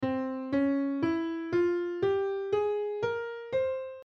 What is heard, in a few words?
phrygian dominant mode piano scale